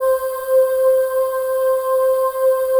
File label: DIGI PAD